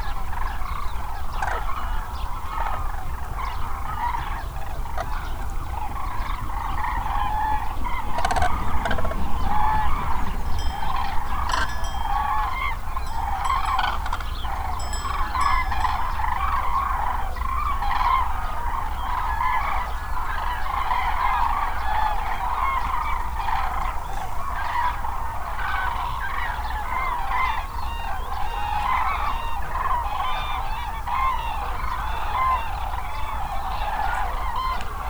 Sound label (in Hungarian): kolompol nyáj hortobágy, daru